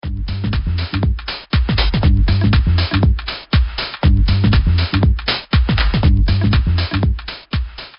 Disco; Pixel Gun 3D; Disco Turret

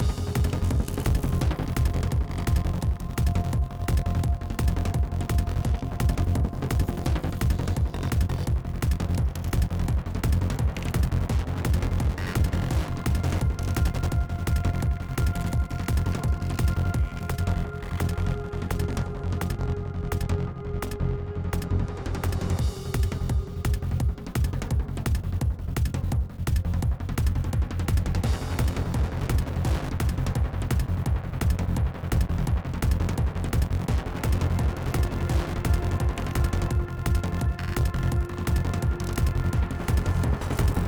Chase 8CH 170BPM; Flytrap

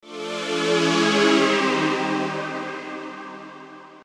Game Loose Sound; Toy Odyssey The Lost and Found

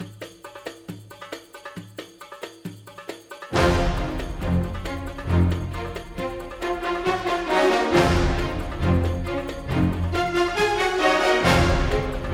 Castle Suspense Loop; Syria

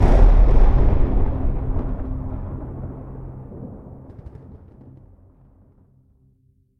Combo C; Mixolumia

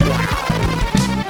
Intro BOSS BATTLE

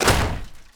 Waterski Hit; Levels Hub Bayou 02 Twitch Waterski; Waterski Hit Obstacle

